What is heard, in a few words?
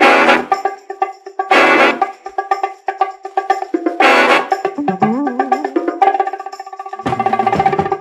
horns bongos, Loops, Phonky